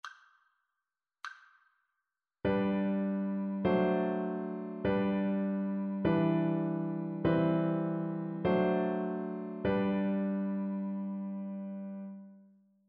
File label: harmonic dictation